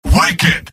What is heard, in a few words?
scout cheers; mvm